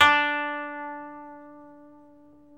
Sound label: KOTO MILD